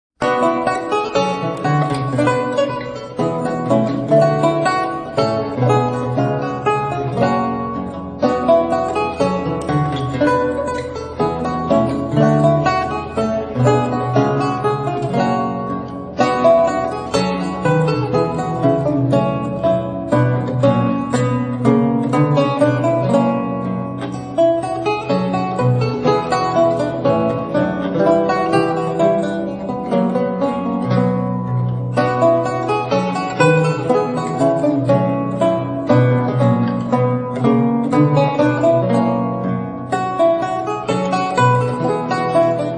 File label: lute; francisque